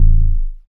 BAS FM DX-7

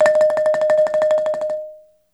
BALAFON, African Melodic; E-MU Producer Series Vol. 7 – Old World Instruments

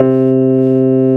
SUIT AMP C2, Keyboards Old School; USB Soundscan vol.09; AKAI

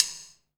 DR RIM3S; Best Service Gigapack 2

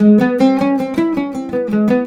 LUTH, Arabian Traditions; USB Soundscan vol.43; AKAI

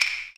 CLACK, JAPANESE PERCUSSION; AKAI S6000 CD-ROM - Volume 5; Ethic

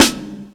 Snare, breakcore Drum Hits

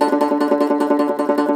cumbus saz D, plucked strings

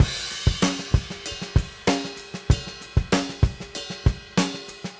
Drums Crash, 70s Analog Funk; Sonic Foundry (Sony Creative Software); What it Is! 70s Analog Funk

